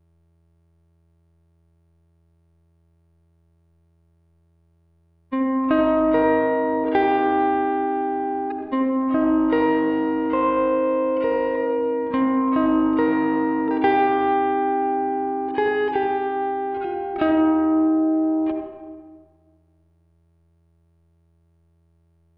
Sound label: gtr texture